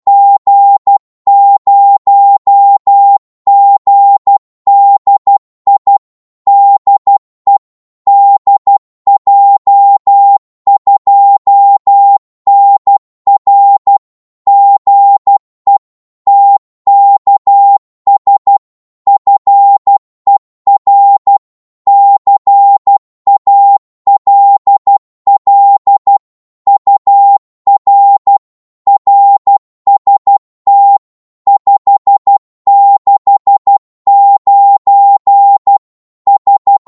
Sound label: qso 12wpm, Morse